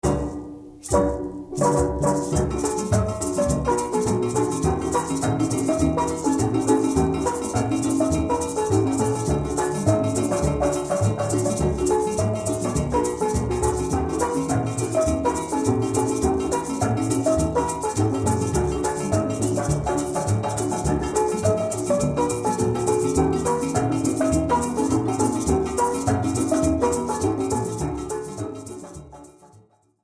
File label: Steel drum music